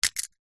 household padlock small metal drop concrete ground